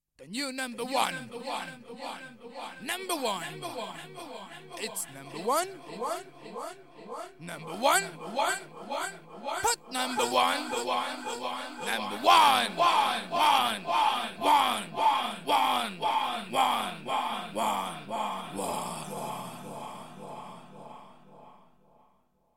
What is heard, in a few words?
Jingle